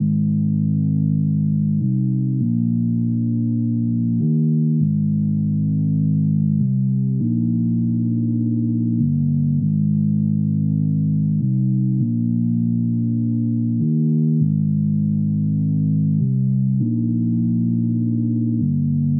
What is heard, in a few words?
Keys Mellow Pop Chords Cm 100; Sample Banks; Melody; Keyboards